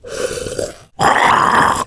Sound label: alert; slender; zombiebm